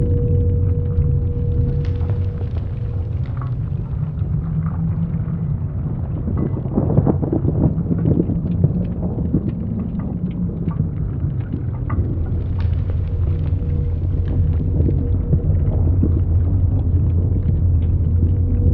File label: Arena fail loop